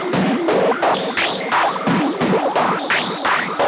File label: Modular 130BPM